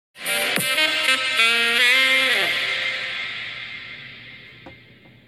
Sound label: Sexy Trombone